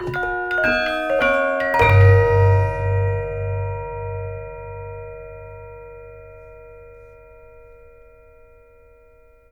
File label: ORCH HIT, GAMELAN HITS; Spectrasonics - Heart of Asia CD2